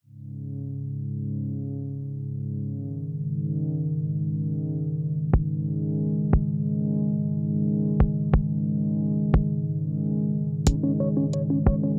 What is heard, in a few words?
gentle transformer